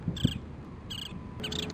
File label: Pratincole